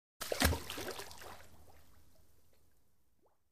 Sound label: Swimming; Single Splash From Arm Movement.